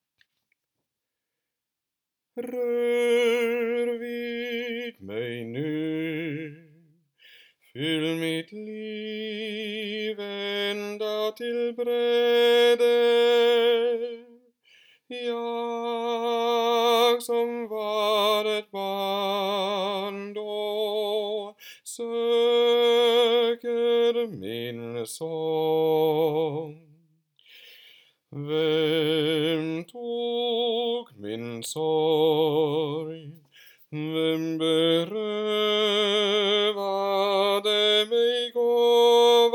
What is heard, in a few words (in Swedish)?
bass